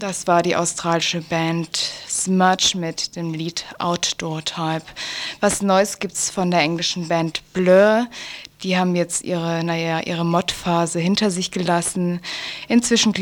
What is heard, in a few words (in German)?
musikmod